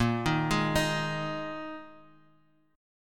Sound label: Bb 7th Suspended 4th, Bb7sus4 chord; arpeggiated; JGuitar